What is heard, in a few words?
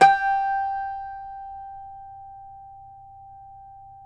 CUMBUS, Guitars; Spectrasonics; Hans Zimmer Guitars VOL-2